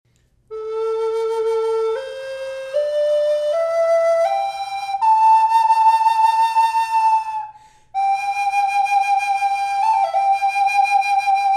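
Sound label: reverb, flute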